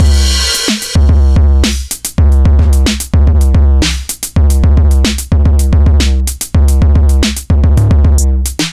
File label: beat boy 110bpm